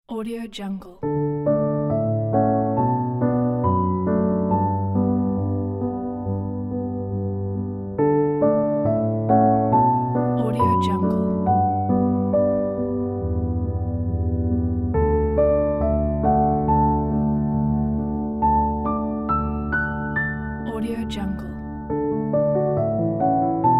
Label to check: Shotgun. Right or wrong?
Wrong